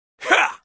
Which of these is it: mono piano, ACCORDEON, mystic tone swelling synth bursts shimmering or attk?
attk